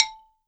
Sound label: BALAFON